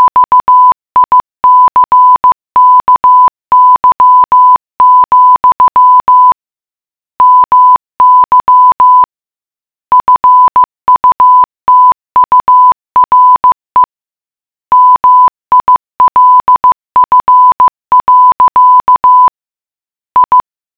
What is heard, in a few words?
morse